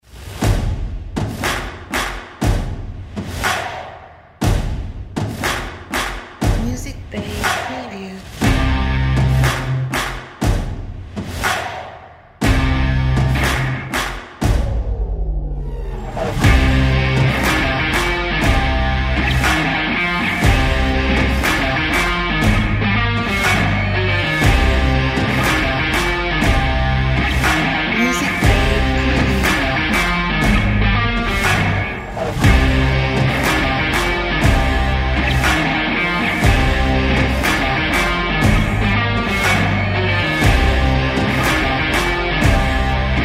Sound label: Rock Stomps And Cars